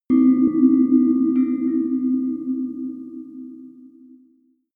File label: melodic devil's clock; uhren-glocken-klingeln